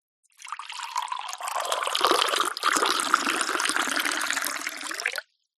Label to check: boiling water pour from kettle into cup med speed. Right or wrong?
Right